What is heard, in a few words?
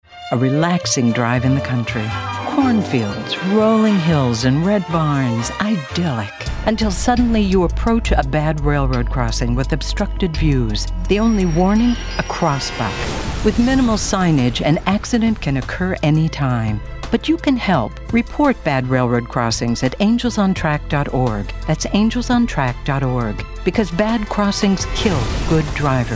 Relaxing Drive